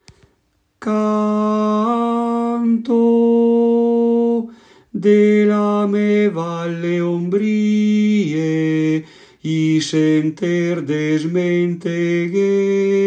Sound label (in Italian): BARITONO; Canto la me val